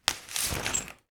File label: umbrella, mechanical sound effects